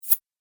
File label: Whoosh; sound design; Swish, Zoom, Swipe, Whoosh, Swoop, Transition